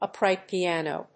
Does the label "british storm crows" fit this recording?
No